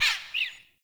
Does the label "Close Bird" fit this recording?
Yes